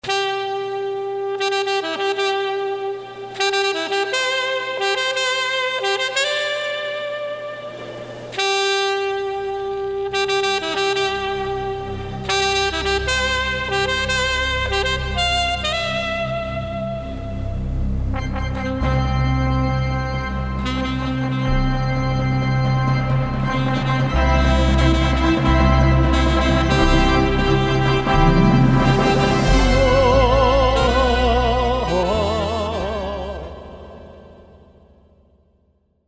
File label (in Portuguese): Clarinada Com Sax